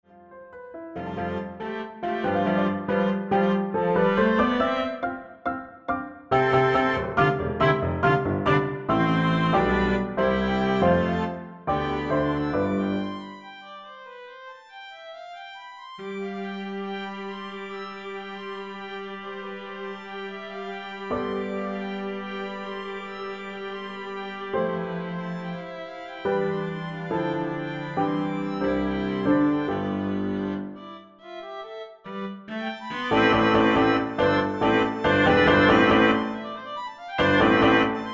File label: piano trio ending